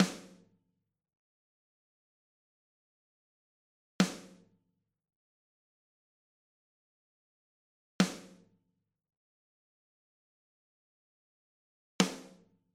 Vermona DSR 3 Snare Trocken

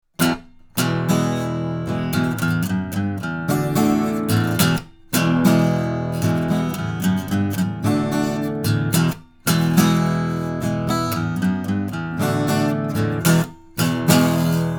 Nugget Gitarre

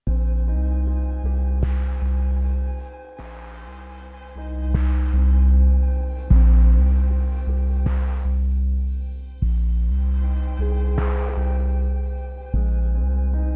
Endorphines Golden Master als Master Effekt

